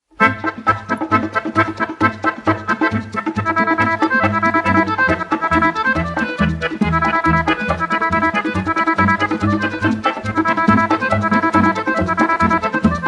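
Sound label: instrumental quintet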